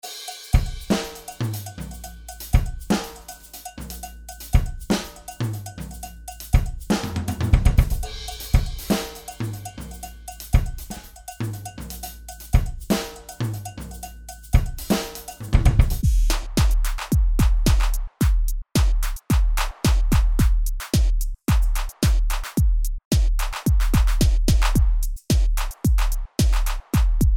4 verschiedene Styles Afro Electro Jazz Reggae

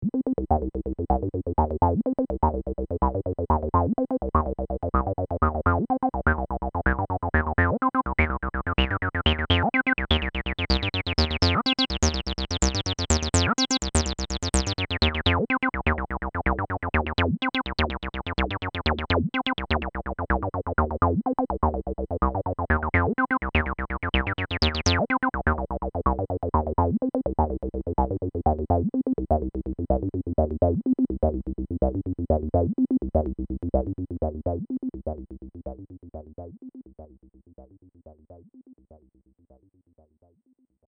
Roland TB 03 Acid Rechteck

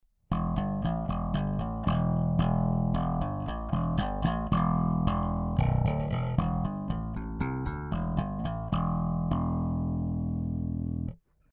Bass Scheppergeraeusch EQ Shaping; Drawmer 1972 Bogart

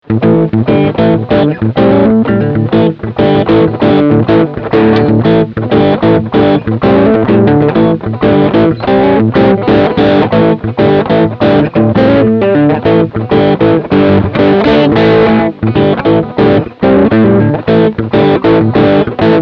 Crunch beide Pickups

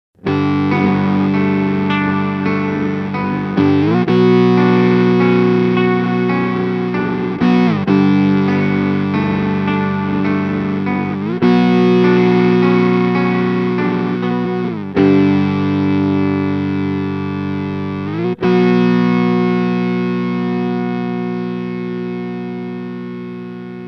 Gitarre mit Bass Preset mit Clean Anteil; Subdecay Pixelwave

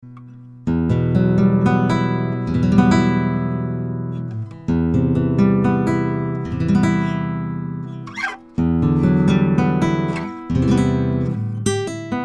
Neumann TLM 49 Nylonstring

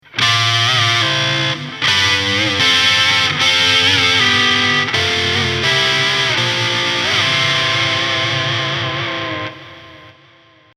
Terzen verzerrt

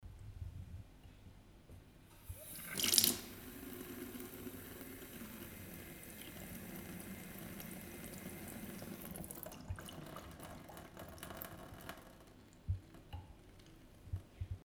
iQ7 Wasser 120 Grad

